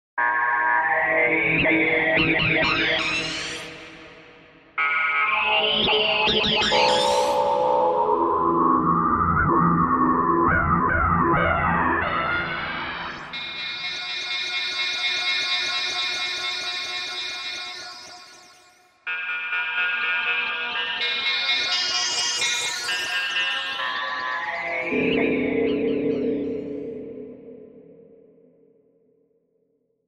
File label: sub wave; Universal Sound Bank; Soundscan 14 Twisted Special FX